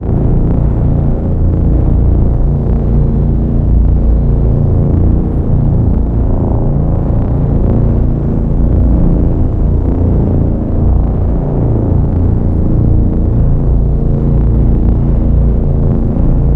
black project cinematic ambience, Mysterious Cinematic Samples; Bluezone